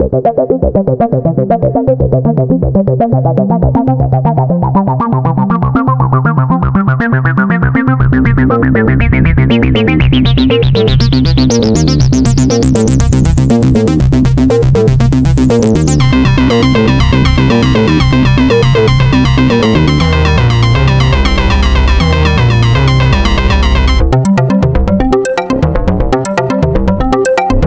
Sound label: Toraiz AS-1; Zampler